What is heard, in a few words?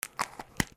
BATHROOM